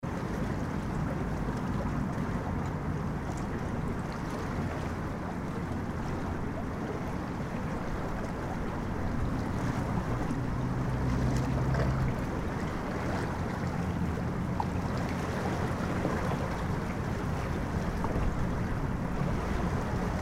SFX nyc hudson river pier

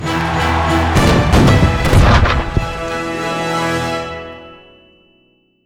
Jingle Gameover Start; World of Warriors; PS4

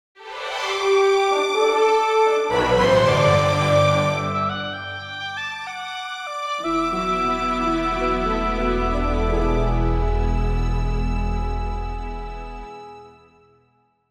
Final Battle Fanfare; Final Fantasy Tactics remastered

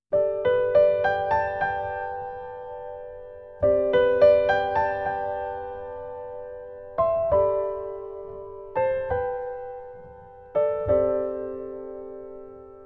Spring Breeze (Piano Solo); 428 Fuusasareta Shibuya de

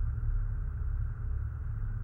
Ambient Humm

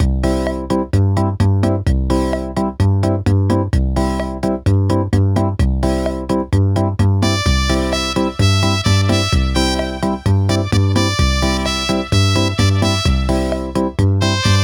CASIO Rumba; Wunderling

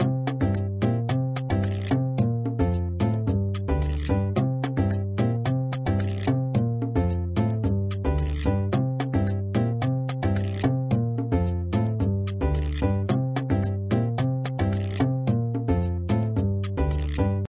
Grillscreen Music; papas cupcakeria online windows gamerip 2013

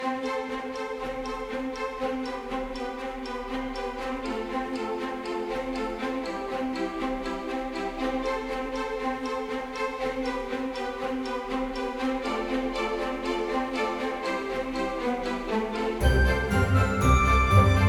Ice Fields

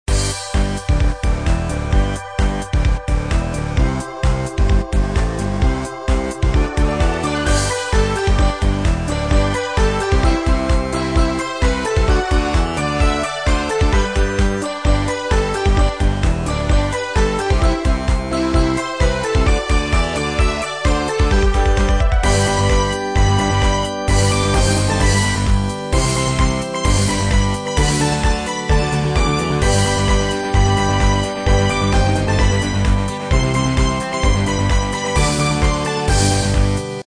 Game Background; Snow Line

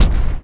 footstep

